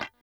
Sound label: A 3 FUNK CHORDS, Guitars; Spectrasonics; Hans Zimmer Guitars VOL-1